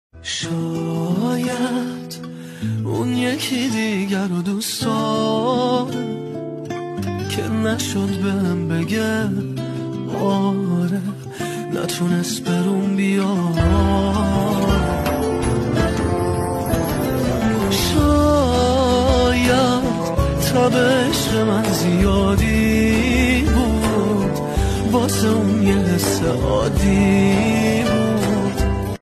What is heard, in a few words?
Guitar; با گیتار